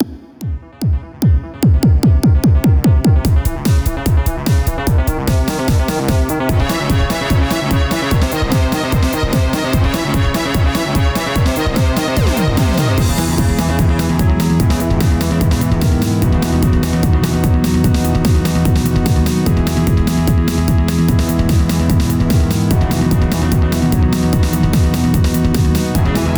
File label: battle